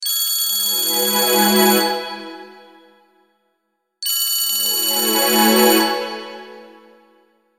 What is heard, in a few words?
clock alarm; Ringlord